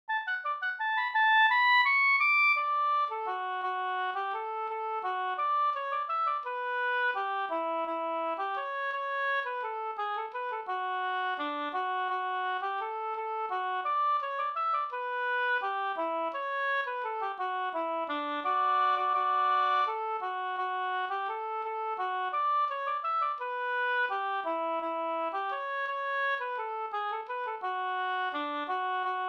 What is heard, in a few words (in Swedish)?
vals D dur